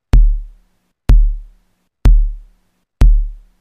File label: Ambient House Kick 125BPM